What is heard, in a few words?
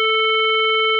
power odd cos, synthesizer; lange-nacht-2009